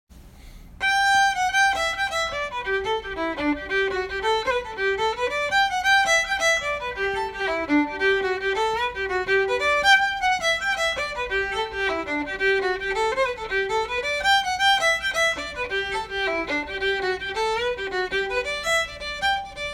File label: blackthorn stick